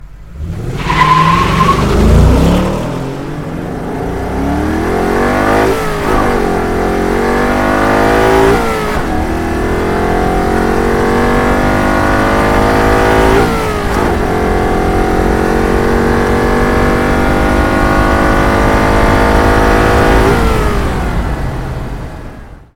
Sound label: RINGTONE; fordmedia; North America; US